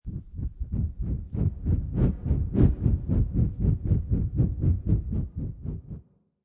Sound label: Fan, Man made fan spinning noise with a hint of reverb; Electronics; Michael Huang